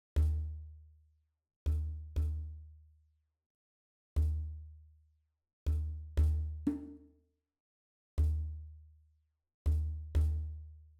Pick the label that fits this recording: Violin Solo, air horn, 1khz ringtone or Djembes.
Djembes